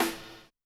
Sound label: SD Hip